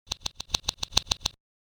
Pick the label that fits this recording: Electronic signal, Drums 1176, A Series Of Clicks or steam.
A Series Of Clicks